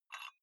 dumbbell take off very small weight gentle

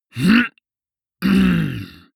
male harrumph angry; soundpool

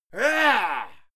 male fight scream heah; soundpool

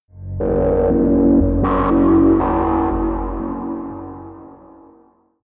sci-fi cosmic dark energy, horror

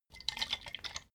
bottle vodka shake single; berufe

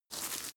step grass step; schritte; menschen

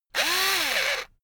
electric drill screw in medium; maschinen; bohrer; soundpool

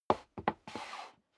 step sneaker wood creak scuff; schritte

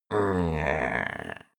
male grumble comfortable; menschen; koerpergeraeusche; soundpool

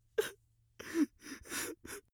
male whimper; menschen; koerpergeraeusche

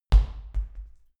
medicine ball bounce, fitnessstudio; sport freizeit

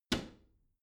bathroom cabinet close regular

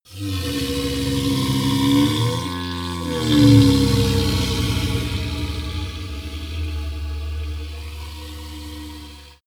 golem growl call; schreie

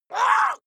water monster female gargle pain short; koerpergeraeusche; comedy-cartoon; soundpool

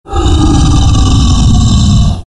danger growl; kreaturen

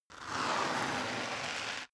step boots concrete grit slide long; Footsteps; schritte

